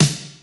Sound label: old school smooth acoustic snare sound D# key; Duration 0:01